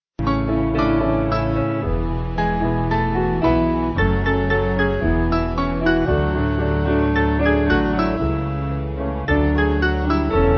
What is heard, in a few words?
Piano